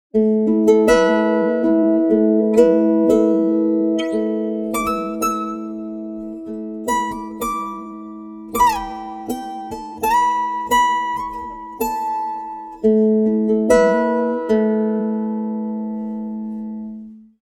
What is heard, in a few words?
pitchshift, guitar; digidesign pro tools xform